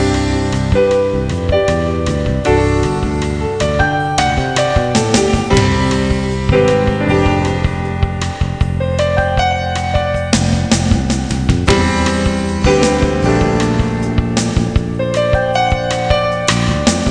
LOCKER MUSIC; DECATHALON

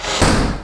close the door; Dart Board

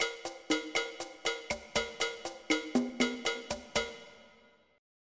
SALSA

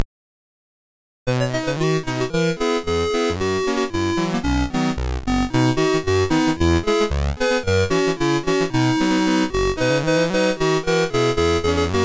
polka, Commodore SID Music

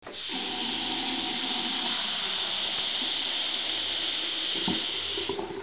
run wtr; Image Bonanza; Expert Software; househld

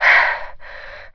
breathe; PC Gamer IT CD 41; Daikatana; mikiko

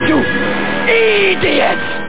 we lose; dynamite

